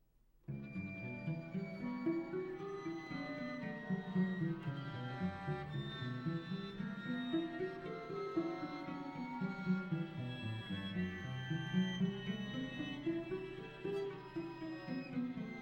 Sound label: string quartet